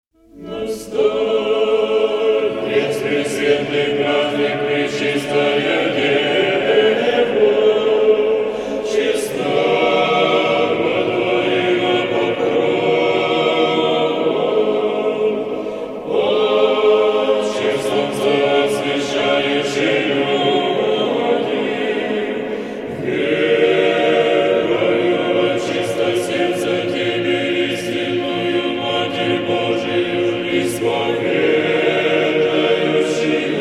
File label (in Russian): СТИХИРА; НА ЛИТИИ ПОКРОВУ БОЖИЕЙ МАТЕРИ